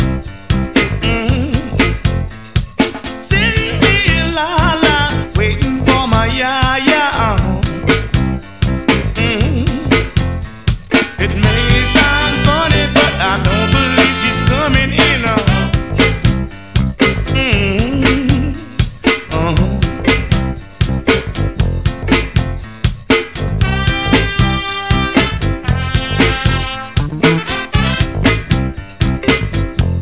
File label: blues